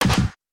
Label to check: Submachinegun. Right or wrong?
Right